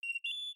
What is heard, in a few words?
earned achievement; star-wars-rebels-team-tactis